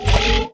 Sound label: Brick Turned On; Ricochet